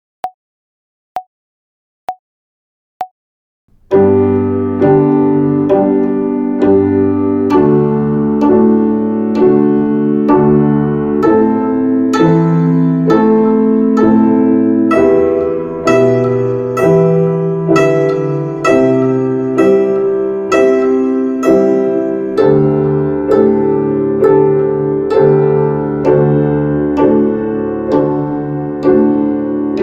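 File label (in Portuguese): Ginástica do Pizzicato com cordas soltas, Violino; Violino para os Pequeninos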